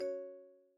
notify; scala 40 piu

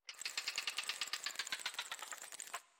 spin high risk; hotline

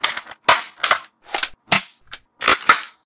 reload

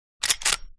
model94 cycle, guns

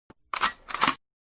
Game Gun Reloading

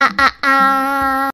a a aaaaa; gura

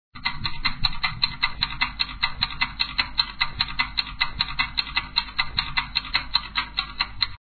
clock fast; bubblespinner; html5 games

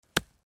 click

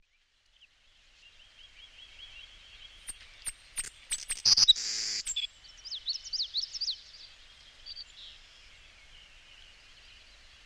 loop sparrow

